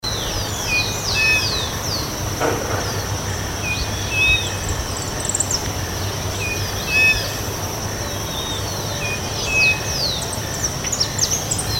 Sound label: Tapera naevia; Lag Diario, Maldonado, UR